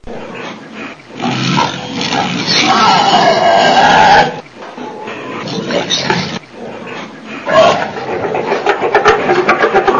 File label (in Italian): CINGHIALE IN AMORE